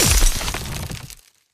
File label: hitting ice